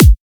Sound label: kick soft grass; drums; base pack